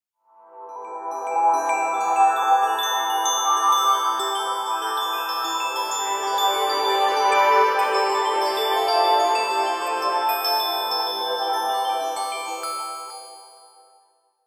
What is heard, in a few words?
Clock alert